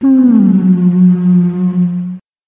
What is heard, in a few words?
monster whale